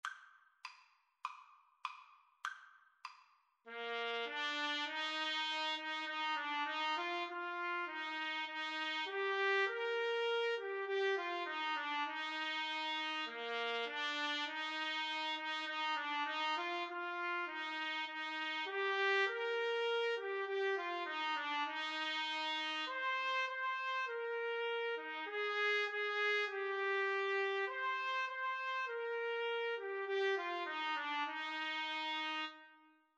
Trumpet Trombone Duet

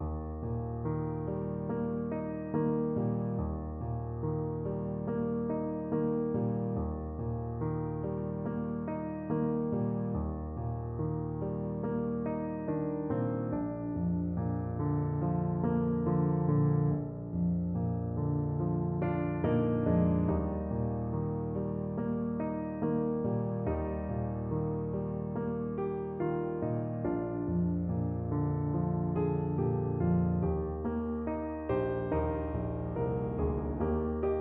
skip to my lou, Tuba

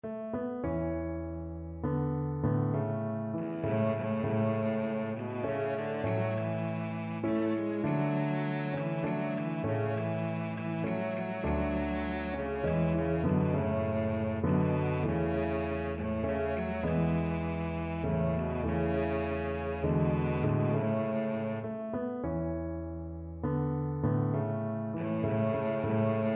Shenandoah, cello

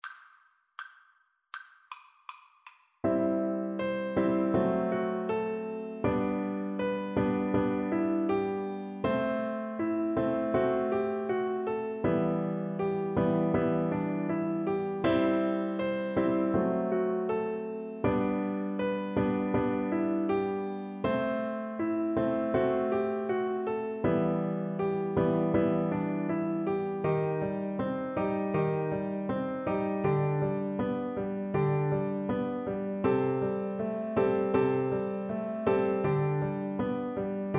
polovtsian, Double Bass

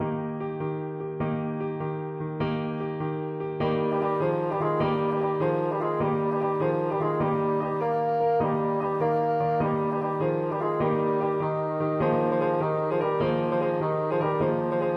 berlioz serenade, bassoon

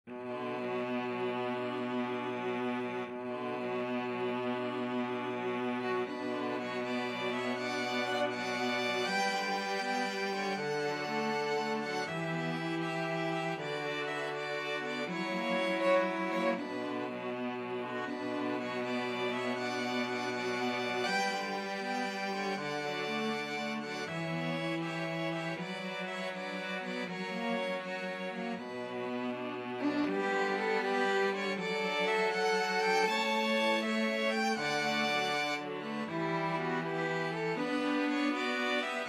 string quartet

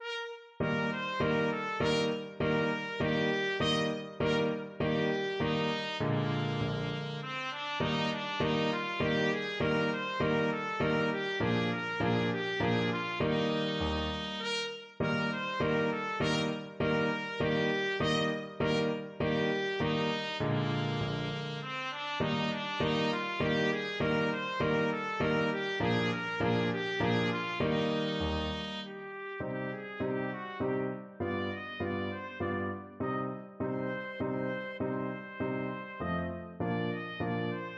german dance, trumpet

